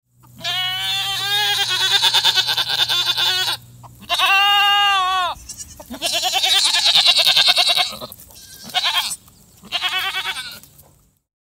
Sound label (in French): chevre; loto sonore; pour les petits; loto des animaux